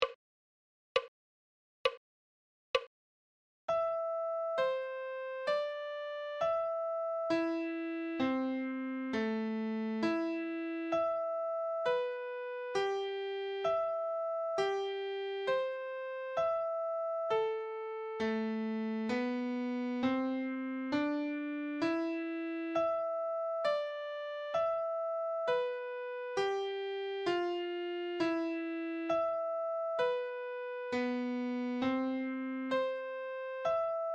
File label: treble clef adagio